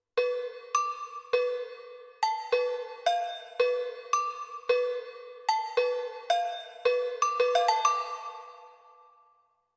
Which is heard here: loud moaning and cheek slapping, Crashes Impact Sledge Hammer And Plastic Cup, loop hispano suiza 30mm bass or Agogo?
Agogo